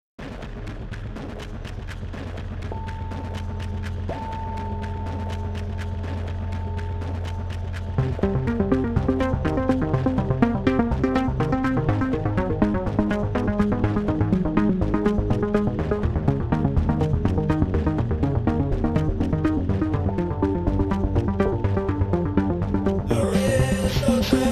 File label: speedtom